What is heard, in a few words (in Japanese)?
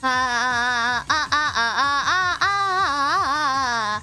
チューニング